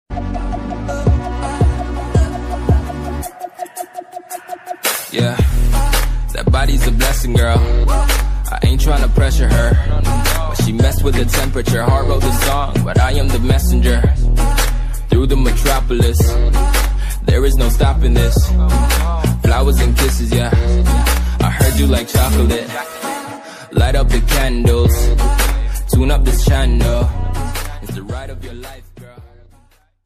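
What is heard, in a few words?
background music RINGTONE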